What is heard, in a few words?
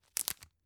tissue open